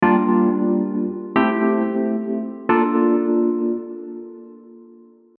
Phaser Ballad Chords

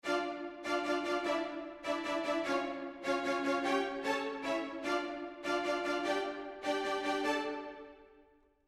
Str Samples Spiccato Part

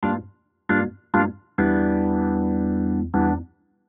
Rotary Speaker Chords

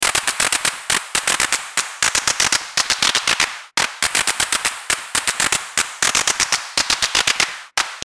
Drum Synth Clap with clip automation

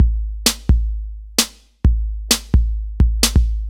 miami bass

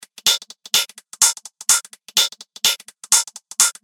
Beat Hi Hat Loop

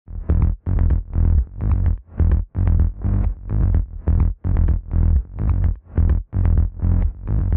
Sub Bass With CLA Bass

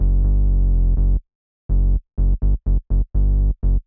neve

